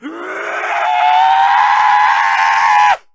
zombie rage

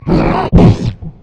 gas spit

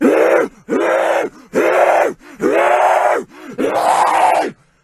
zombie rage

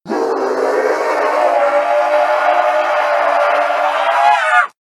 zombie rage

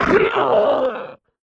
gas death

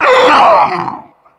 charger death

